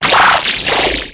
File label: Horror